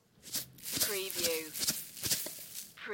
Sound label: Salt shaker, shake salt, sprinkle salt